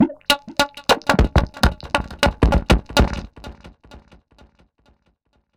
fx high; sidsonic tubes

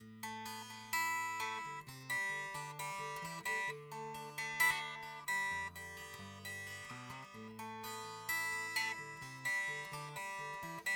harley benton cst-24 acoustic rode nt2-a

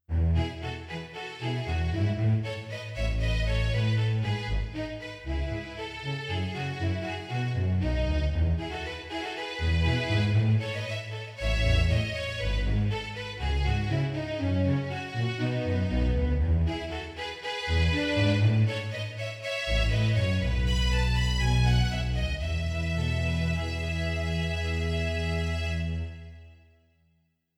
stereo strings; Casio PX-S3100

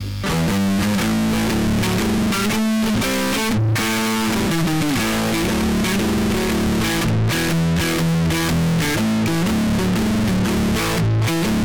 distortion

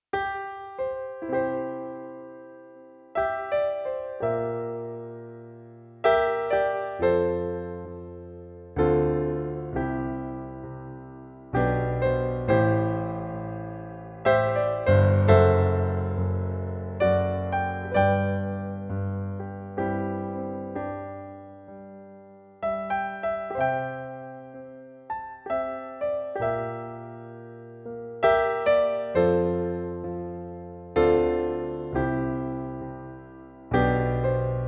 grand piano; v3 sound grand piano xxl

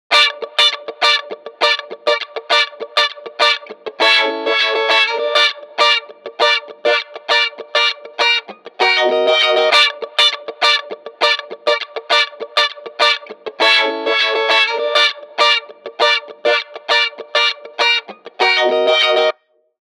funk guitar wah wah sequenzer band pass; universal audio moog multimode filter xl

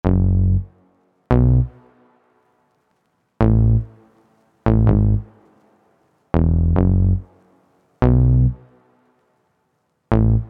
bass; Lo-Fi Hip Hop Produktion

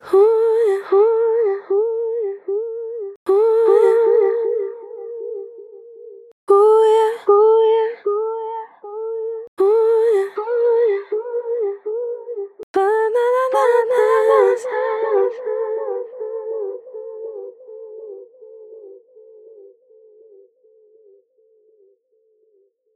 tape echo delay; ik multimedia; Delay-Plugins

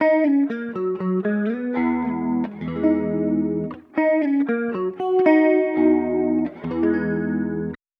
guitar chorus deep chorus; Arturia; jun 6; Arturia FX Collection 2 Test

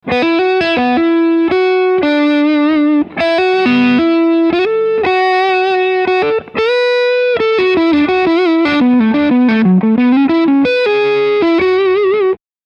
epiphone sheraton ii neck overdrive

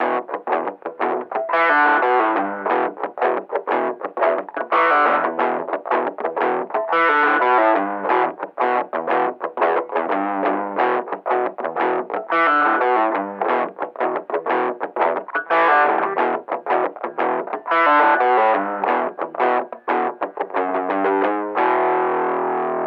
orange 2x10 telephone; universal audio ox amp top box

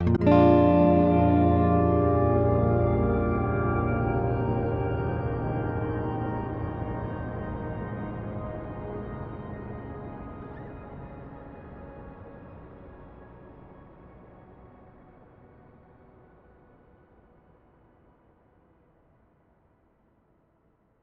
strum wow; eventide h9

